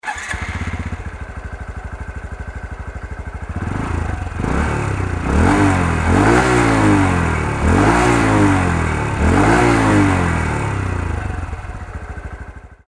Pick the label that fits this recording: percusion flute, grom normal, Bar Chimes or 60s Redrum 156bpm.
grom normal